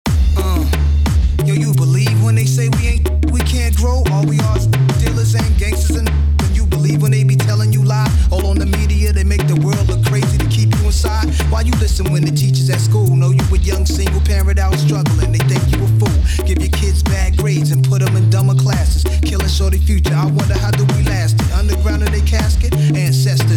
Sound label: acapella